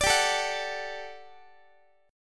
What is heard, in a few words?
G Major 7th Suspended 2nd G down Guitar; GM7sus2; strummed